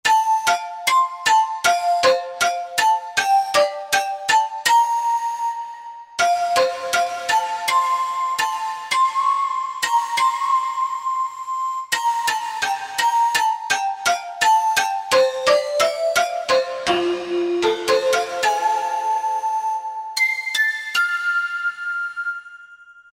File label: Wack Flute, Korg Wavestation A-D